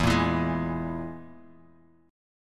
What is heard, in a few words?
E Major Flat 5th, Guitar Open G